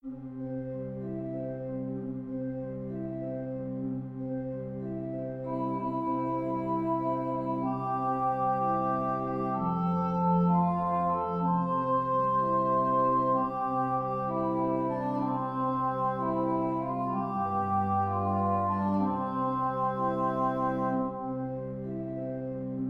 O helga natt orgel

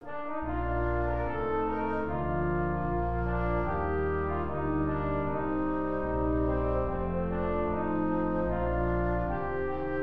Kyrkmarsch B-dur

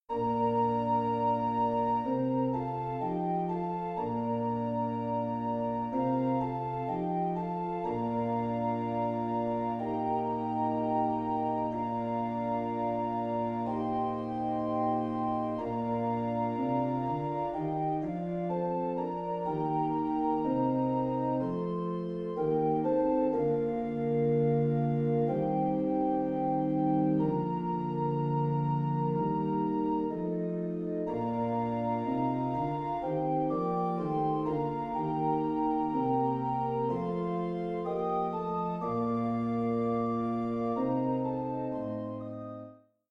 orgel komp in Bb

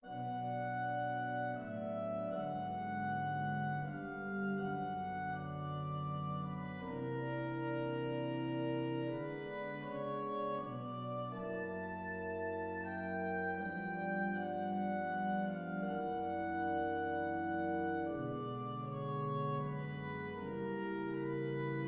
Melodi

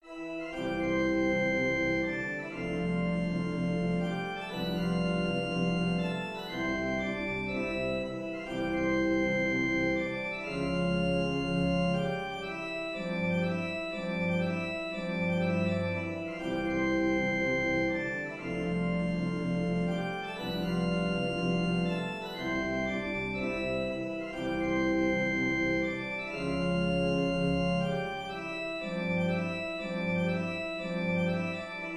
Marsch

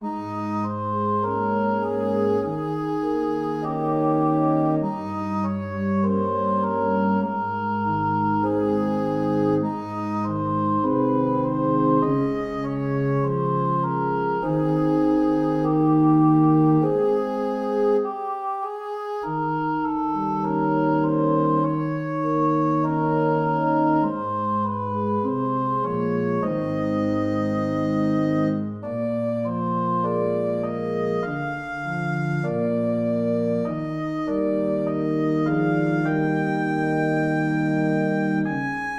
orgel

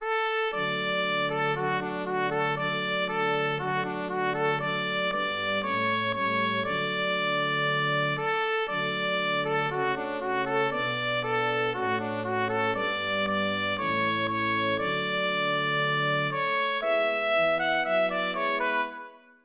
Brudmarsch från Oland

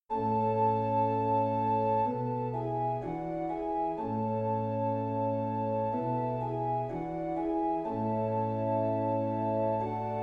orgel komp

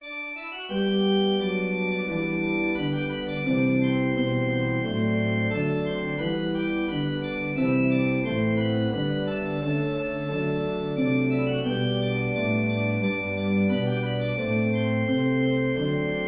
Gotlandsk brudmarsch

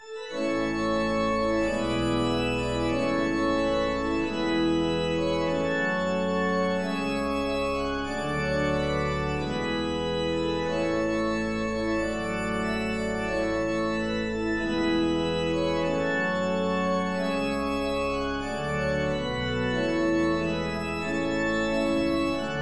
Nordsjo brudmarsch

